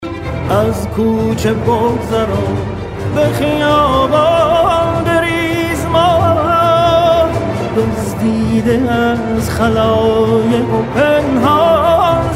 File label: Mobile ringtone